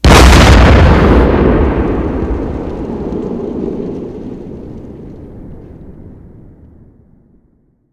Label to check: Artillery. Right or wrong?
Right